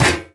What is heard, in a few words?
physics turret gibs; Portal 2